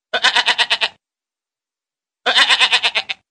goat

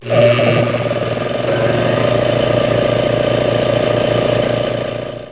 tools

